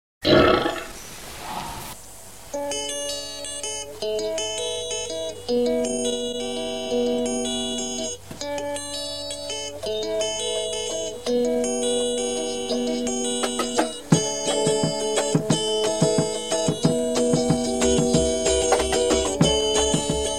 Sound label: Instrumental